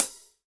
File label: HH WET, Drum Mashines, SR-16 DRUMS; EdgeSounds; Drum Mashines VOL-1